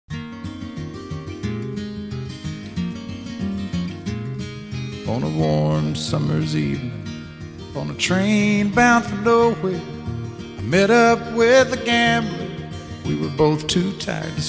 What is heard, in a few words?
violin song